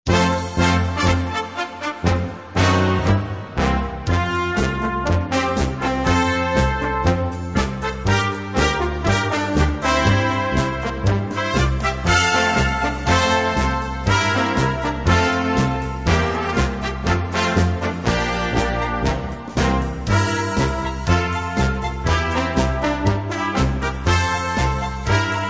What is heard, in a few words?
polka; gold und silber; lehar franz